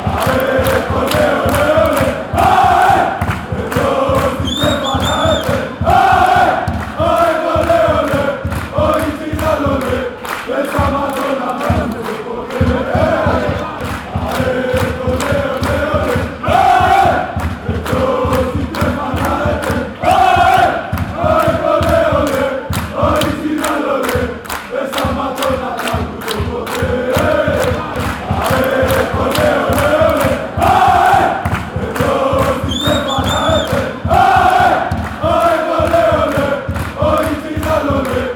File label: aek athens chant